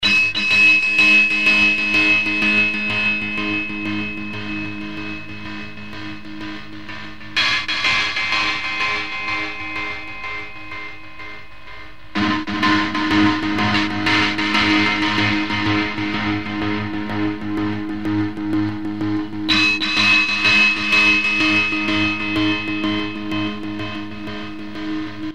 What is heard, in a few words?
guitar gate